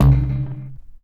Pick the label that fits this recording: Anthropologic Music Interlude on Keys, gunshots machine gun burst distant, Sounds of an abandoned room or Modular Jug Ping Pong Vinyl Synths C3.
Modular Jug Ping Pong Vinyl Synths C3